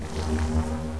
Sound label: shock recharge, weapons